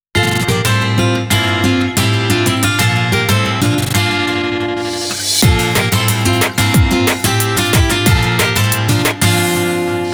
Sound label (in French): cloche; Reflec Beat Limelight